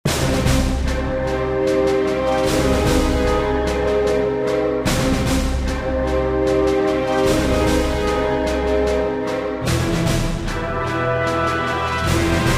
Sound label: battle; starfleetcommand3